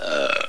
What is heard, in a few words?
burp